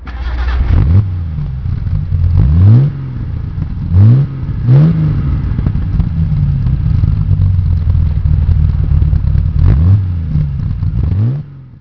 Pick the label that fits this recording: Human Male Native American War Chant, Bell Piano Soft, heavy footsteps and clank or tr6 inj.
tr6 inj